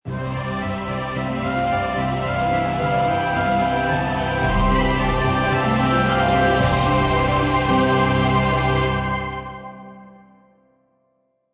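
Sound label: Loop Orchestral Stinger; Orchestral Stinger 11 Seconds; Bumpers; Royalty Free Bumpers Music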